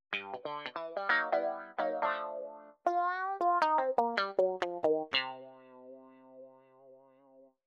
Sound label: Roland Fantom XR Wha Guitar